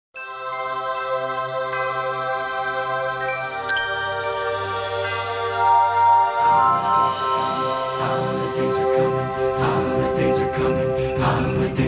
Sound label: Christmas tune; Coca-Cola